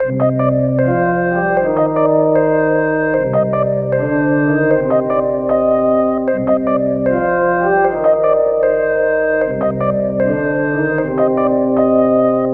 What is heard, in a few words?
melody loop ringer 153 Cm